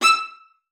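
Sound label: E6, viola spiccato; Xsample VOL-5; 90 s Sample CDs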